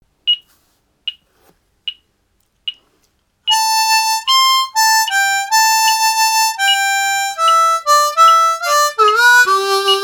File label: V IV I descending